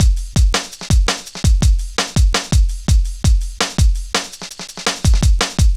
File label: Drum Loops, Jungle, 166.5